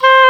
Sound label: oboe, Roland; Oboe Dry; Roland L-CDX-03 Disk 1; Oboe 4-8